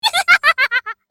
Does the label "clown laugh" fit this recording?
Yes